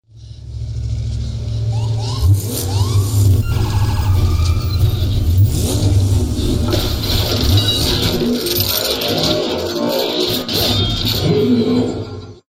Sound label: Transform; Barricade